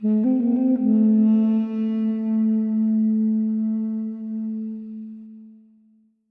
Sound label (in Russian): zvuki duduka